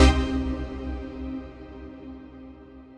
HOUSE, House Dance

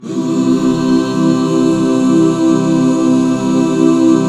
C MAJ, Back Chords; Sound & Vision - Gigapack II CD 1

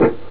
elec gt fx; jazz jackrabbit 2

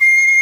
SHIAO C5

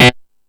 Killer Synth, E-MU Pro-Cussion; E-MU Pro-Cussion-1

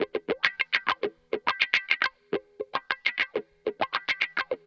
103 FUNK GTR WAH; 103 BPM FUNK B; Drumdrops; Compact Disco

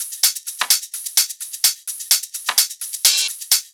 Loops 128BPM, Drumloops

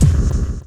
LOOP; X-Static Goldmine 2

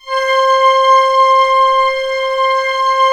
orchestra C5 PP; Sound On Sound - Peter Siedleczek's Orchestra

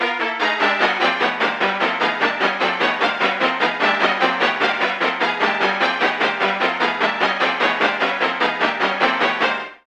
STRINGISH; Masterbits - Tekkno Trax; SQ LOOP 150

